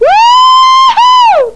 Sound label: WOOHOO; Carmageddon